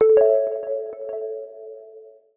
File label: Notification Sound